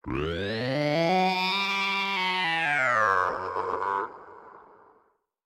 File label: Spin; Hustlebonez Spin New (Long)